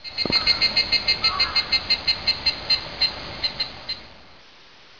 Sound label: cicada